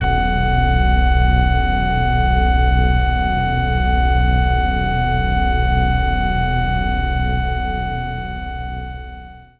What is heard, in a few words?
Digital Organ Leadpad C1